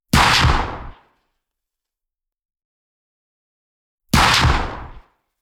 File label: L7A2 GPMG 7.62x51mm belt fed 50m behind clean Single shots tracer; Schoeps